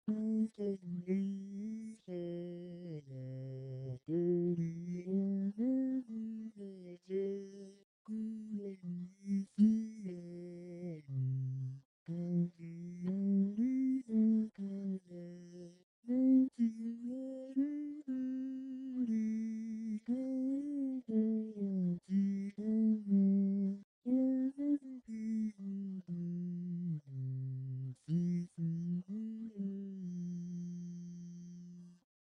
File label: Guitar; MEIKO-Guitar2